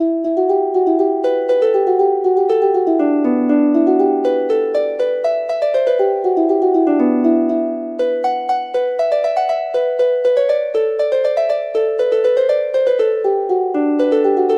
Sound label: harp